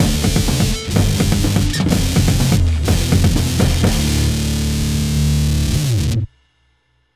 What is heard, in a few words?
win round; A Sound Plan